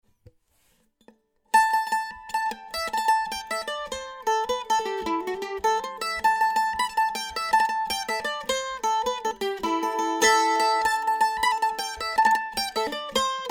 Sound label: mandolin